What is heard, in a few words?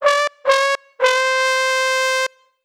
trombone disappoint